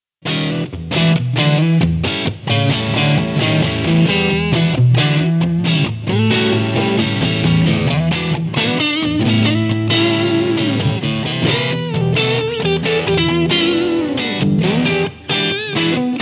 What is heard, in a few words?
MAXON OD9PRO+ Bypass